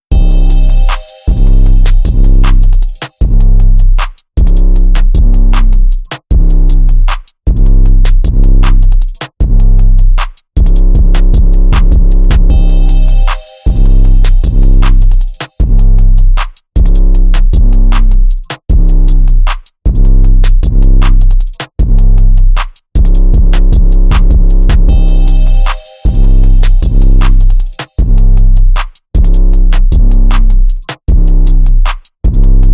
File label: Instrumental Rap Hip Hop Beats Dark Simple Freestyle Beat